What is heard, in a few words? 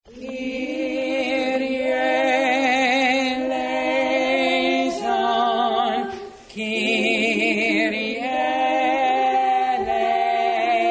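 kyrie eleison bass with tune background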